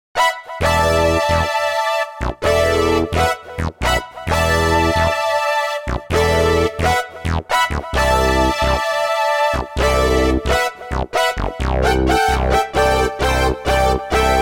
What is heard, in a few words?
carillion abyss; Jupiter-80 v2